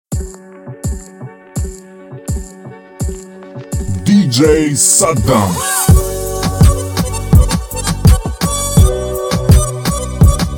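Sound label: instrumental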